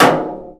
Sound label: metal box impact hard; physics